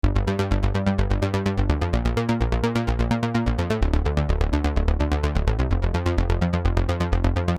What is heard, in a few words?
junobass sequence